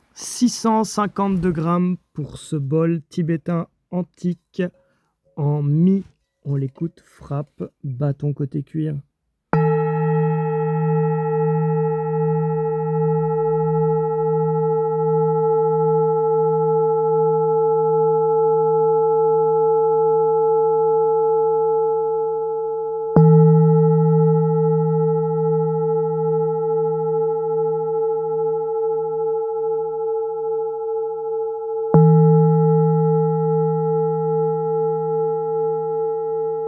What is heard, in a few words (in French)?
Bol Tibetain Antique Note Mi bemol 17 cm